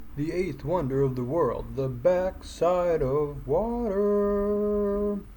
Jungle Cruise, Magic Band Scanner; Disney Magic Band Scanner

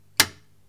flash sound

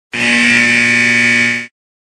shot clock; Kobe Themed

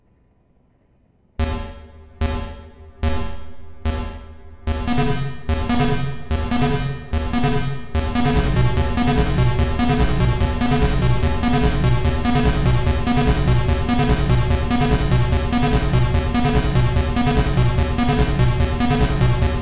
cicadaboom, 8 step sequencer and a simple synth

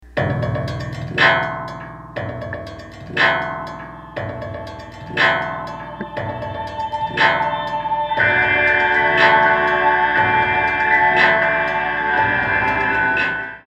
Reverb sample, Spring Reverb; Anachronistic Audio Effect Unit

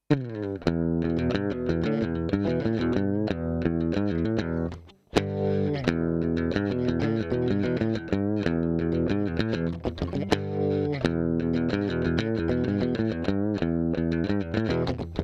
sample compressed, Guitar Bass Compressor; Dual Band

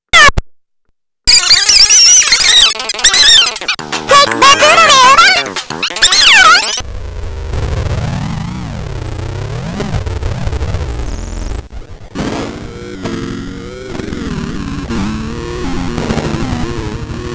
billy bass; Circuit Bent Big Mouth Billy Bass

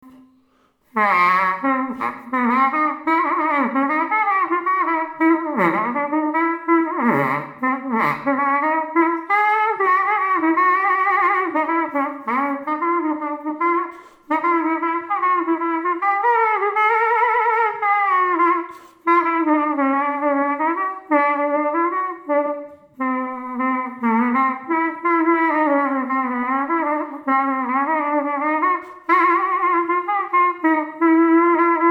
SAX TOOTER; Tootophone Sax and Trumpet

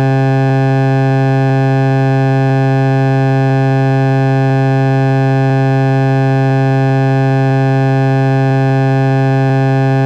timer display for microwave oven

